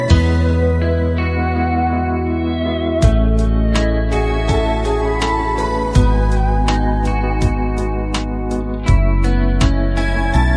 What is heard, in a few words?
Peaceful Afternoon